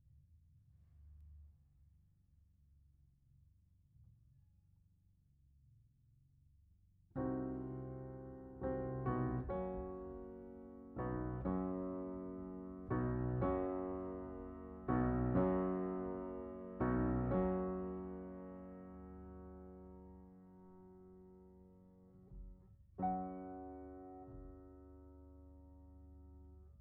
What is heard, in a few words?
Piano Mono